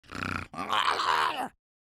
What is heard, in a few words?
goblin bomber scream